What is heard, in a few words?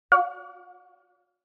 nfc scan failure, iOS Sounds